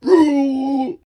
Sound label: startle short